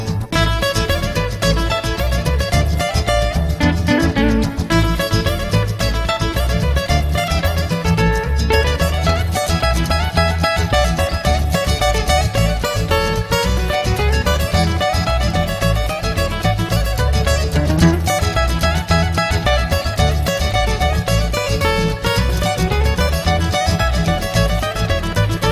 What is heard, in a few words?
Gypsy Guitar